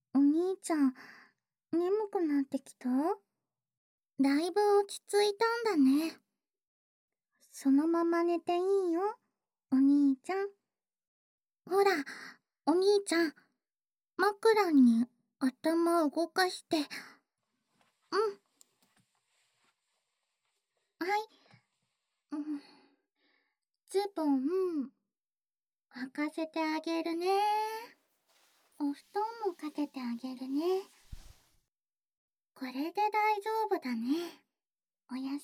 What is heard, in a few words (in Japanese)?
ダブル耳舐め～子守歌; 安眠; 子守歌でお休みなさい